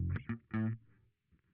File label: Bass Sansamp